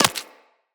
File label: Bullet Impact Flesh; Weapons; Bullet Impacts